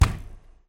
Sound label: slam